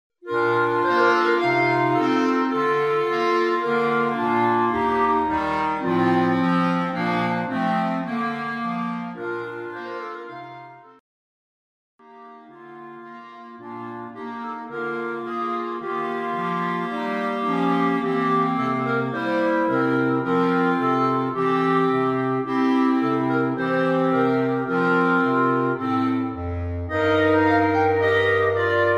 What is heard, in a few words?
Gavotte; Pergolesi; g-b-pergolesi-arr-bernd-jakob